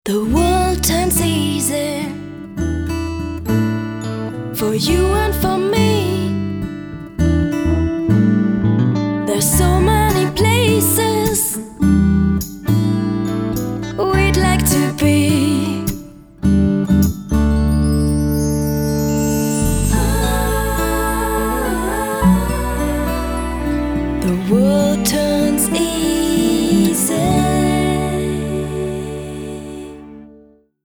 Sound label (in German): Gesang